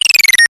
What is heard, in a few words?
science fiction robot nano bot cute digital chirp beep; zapsplat